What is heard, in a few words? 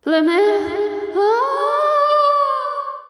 Death; Grey Mourner